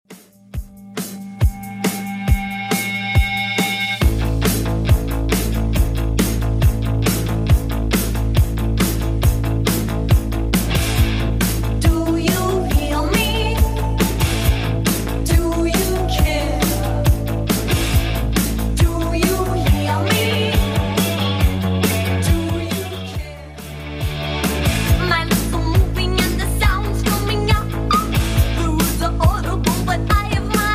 80s Redrum 138bpm

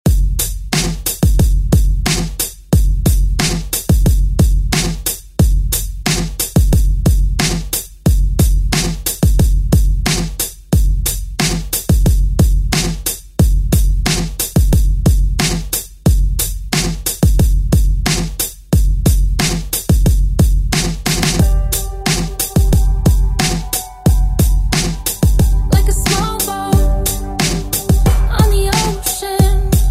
Pop Redrum; Deejay Hit

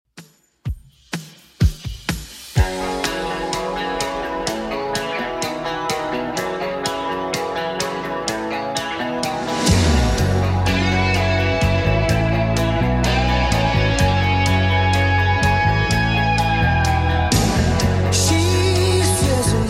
80s Redrum 126bpm

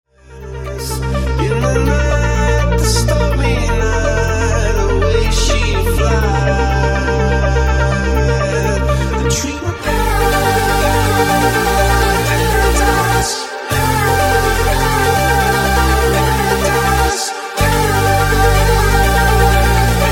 Funky Remix 124Bpm

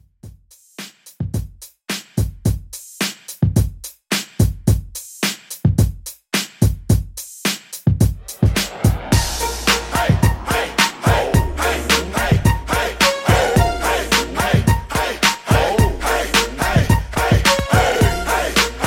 Old School 108 Bpm

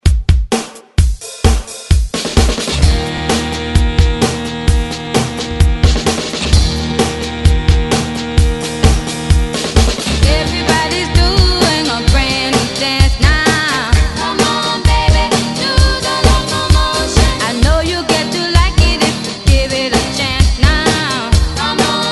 60s ReDrum 130 Bpm; DJDOLLS

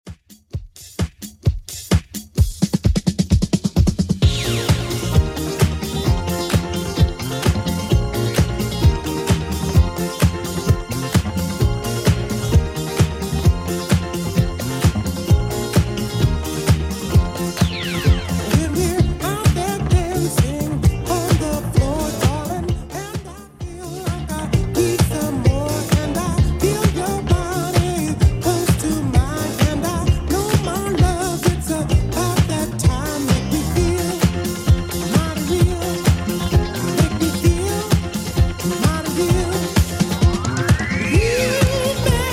70s Redrum 130bpm

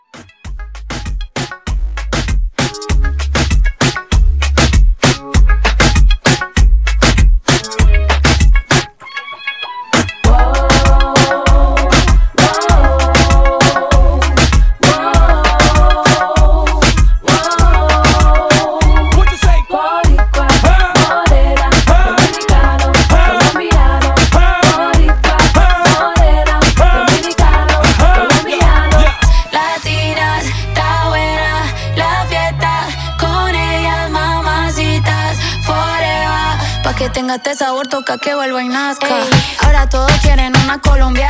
Intro, 98

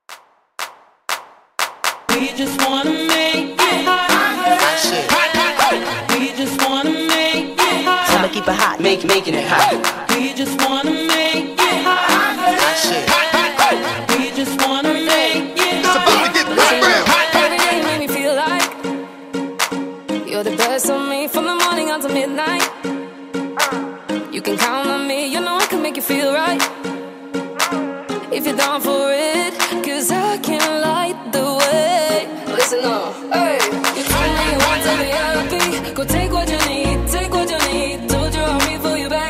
Top40 Clap Starter Dance Mix 120 BPM

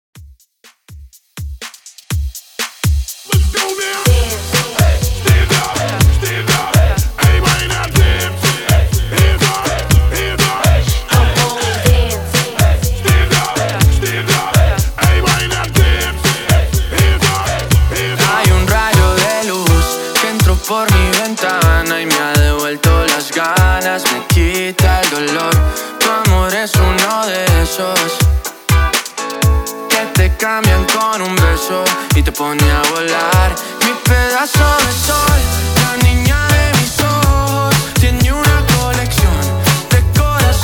latin, 123 bpm

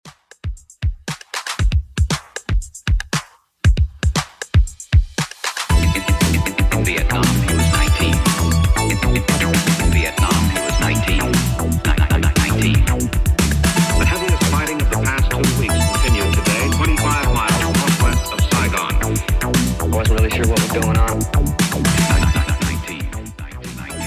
80s Redrum 117bpm

